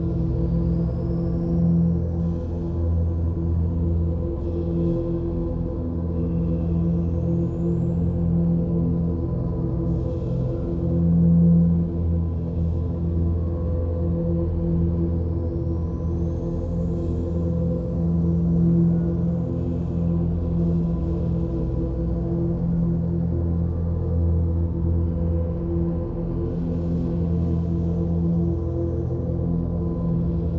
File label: psi ship starbase idle; Stellaris; shroud